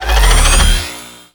Mech Sword Heavy Charge; Warframe; Nechro Mech

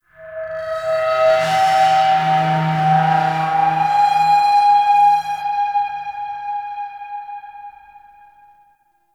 Modular Texture, Ambience; Warframe; Shadowgrapher

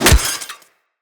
Melee Staff Impact Robotic Flesh

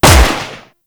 Grineer Lever Action Pistol Shot; Weapons

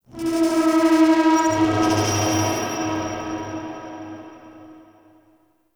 Modular Texture; Ambience; Warframe; Shadowgrapher

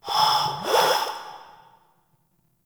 Grineer Frogman Breathe; Warframe; Breathing

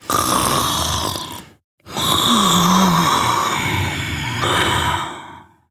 Snores, Mother, Vocalizations; Warframe; Deimos

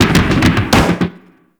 Slide Boost Backfire, Motorcycle; Warframe; Vehicles; Motorcycle Ninety Nine

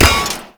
Tank Shield Impact; Enemies; Gameplay; Lasrian Tank; Warframe

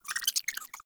Rat Pet Squeak; Warframe; Ambience; Nineteen Ninety Nine

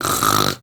Snorts, Daughter, Vocalizations; Warframe; Deimos

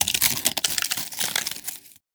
Armadillo Foley Scales Roll; Warframe; Characters; Hunting Critters

